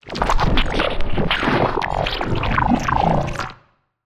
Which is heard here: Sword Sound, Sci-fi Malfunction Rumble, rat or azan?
Sci-fi Malfunction Rumble